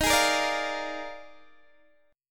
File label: Eb Major 7th Suspended 2nd Suspended 4th, Guitar DADGAD; down